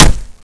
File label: branch hit, weapons